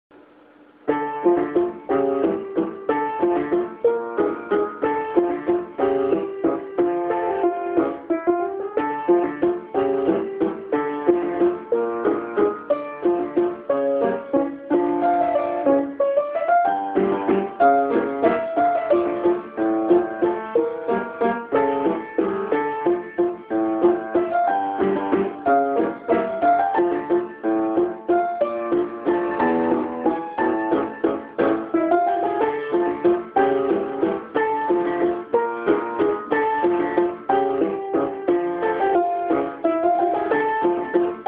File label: Harlequin Piano advanced version